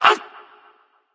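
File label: nonverbal ouch; smite; voicepack; merlin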